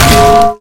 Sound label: pan hit sound effect